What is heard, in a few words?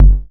Kick Buzzy Bang